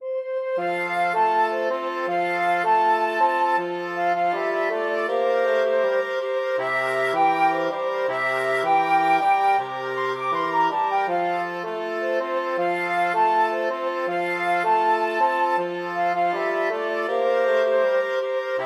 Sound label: la cucaracha, flexible mixed ensemble 4 players